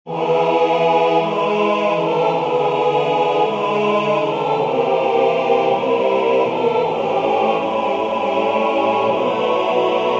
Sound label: All Parts, barbershop